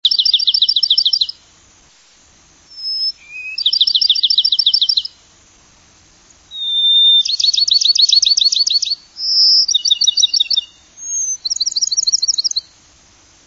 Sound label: Bachmans Sparrow